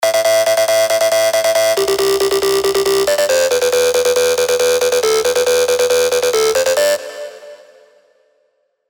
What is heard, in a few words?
Trance Gates; Revealed Serum 2 Trance Gates Vol. 1